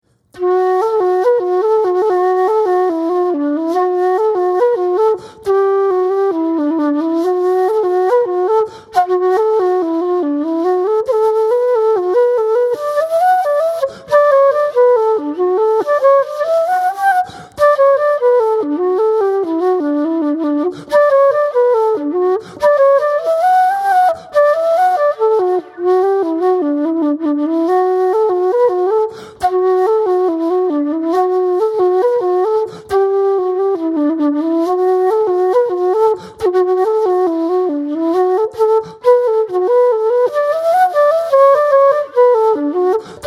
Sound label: Slower